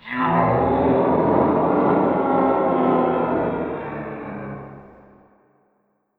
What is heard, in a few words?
pain